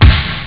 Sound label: Snare